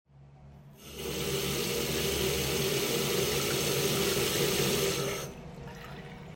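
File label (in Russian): водопроводный кран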